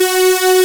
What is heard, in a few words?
BIG SAW F#3, Analog; E-MU Formula 4000 Series Vol. 3; Analog Odyssey; Synth Builder